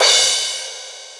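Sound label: cymbal crash; Timidity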